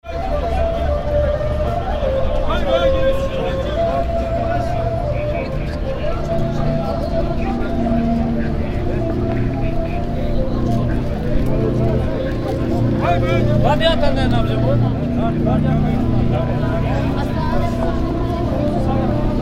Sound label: market atmo